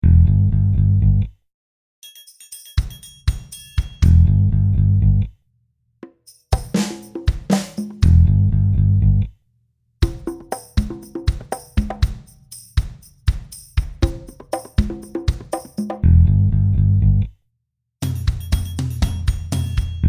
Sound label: bass, blu